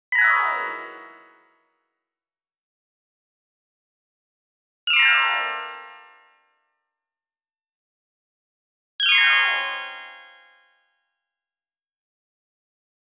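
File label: dark magic sparkling sound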